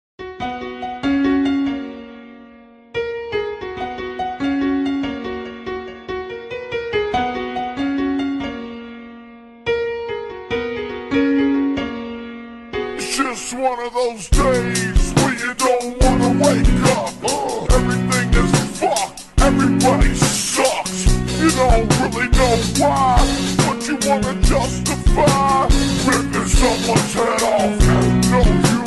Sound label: piano car; reversevape